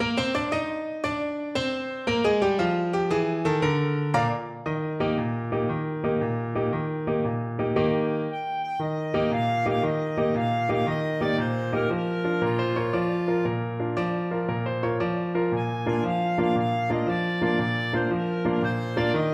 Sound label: luna mezzo mare, clarinet